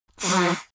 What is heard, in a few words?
nose blow out; koerpergeraeusche; menschen; soundpool